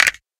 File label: spin start; In The Forest; Wazdan